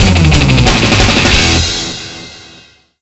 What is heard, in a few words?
Lose; Guitar Rock Tour; DSiWare; NDS